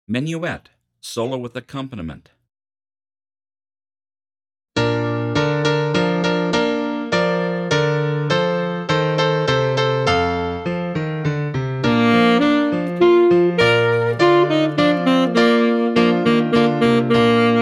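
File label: Menuett Bari Full, Bari Sax; 10pct Slower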